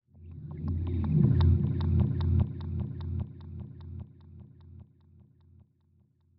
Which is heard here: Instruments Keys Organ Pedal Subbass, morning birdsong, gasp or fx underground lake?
fx underground lake